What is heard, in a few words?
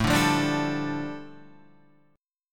A 7th Suspended 4th Sharp 5th, A, down, Guitar; A7sus4#5 strummed